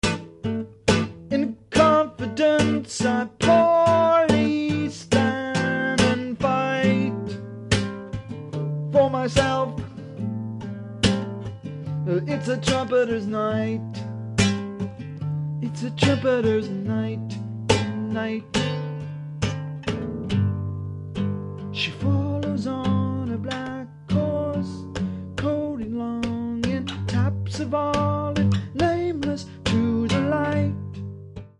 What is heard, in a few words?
trumpeter